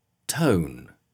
tone; Spellings – /əʊ

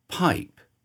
pipe

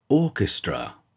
orchestra

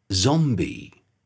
zombie

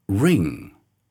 ring

